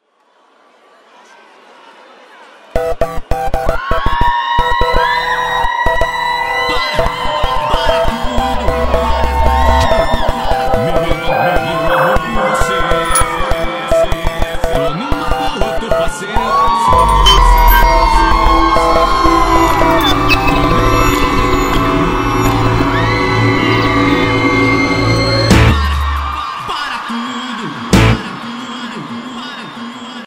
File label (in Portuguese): abertura